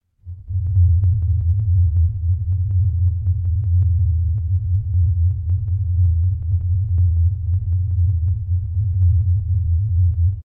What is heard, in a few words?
horror heartbeat